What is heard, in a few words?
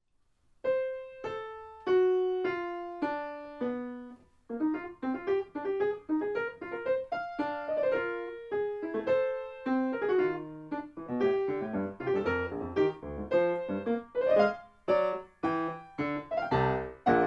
Polyphonic Studies, piano